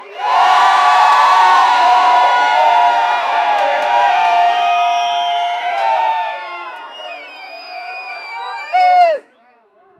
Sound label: a large crowd cheers for; cheering